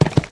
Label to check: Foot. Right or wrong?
Right